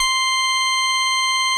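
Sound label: METAL ORGAN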